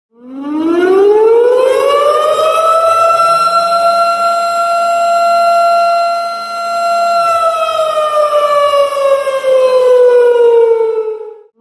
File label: Tornado Siren Sounds Car Horn